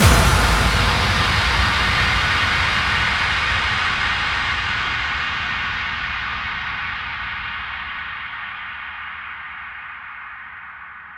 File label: Bassdrums FX, FX Kicks; Vengeance Samples